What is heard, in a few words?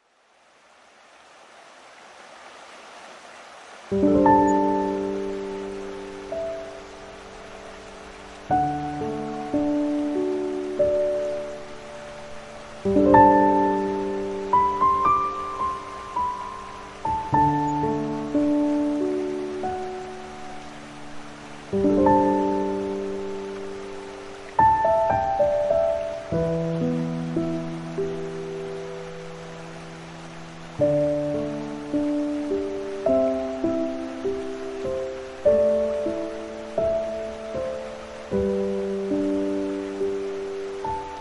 Streaming Water Piano